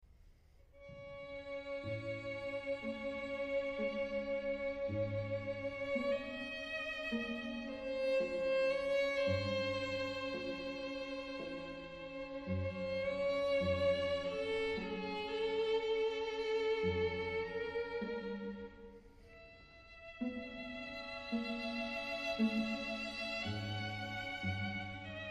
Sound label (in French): Quatuor à cordes; Frank Martin